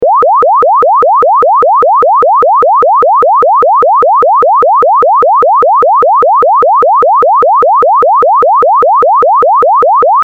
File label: chirp; chirp toys